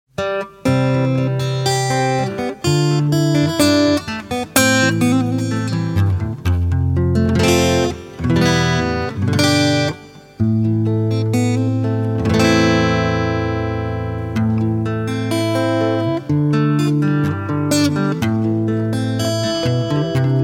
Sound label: instrumental